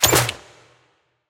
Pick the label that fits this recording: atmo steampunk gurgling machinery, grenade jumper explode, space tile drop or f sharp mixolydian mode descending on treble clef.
space tile drop